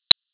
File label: click